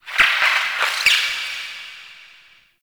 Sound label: Critter Squeak; Warframe; Ambience; Nokko Colony